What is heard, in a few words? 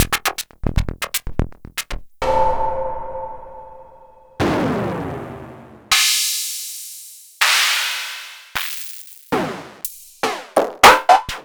Hat c